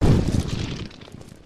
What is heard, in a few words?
floor crush; Ben 10 - Escape Route